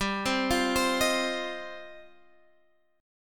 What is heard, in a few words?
F 7th Suspended 2nd G